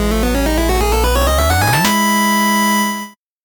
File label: 8bit jingle normal